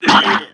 bird stretcher garbage regurgitate projectile fire